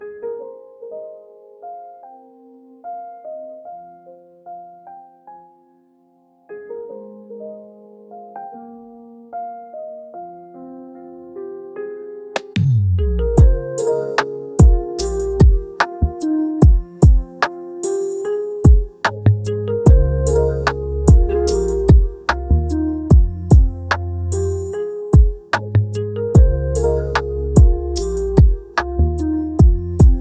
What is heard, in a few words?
rainy lofi